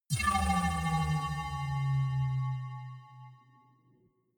energy field vanish medium

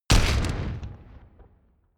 metal spike

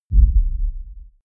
coloss step impact sub distant; schritte

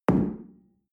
shell place on tablecloth hard; berufe; gastronomie; soundpool

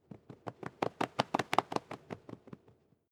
bird wingflap, voegel; natur-tiere

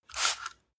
spray bottle single; haus garten

